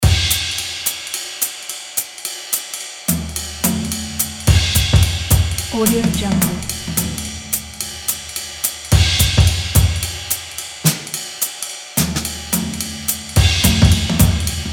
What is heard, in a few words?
jazz drums